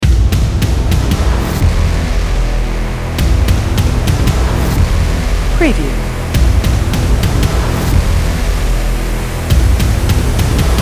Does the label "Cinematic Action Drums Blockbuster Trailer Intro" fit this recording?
Yes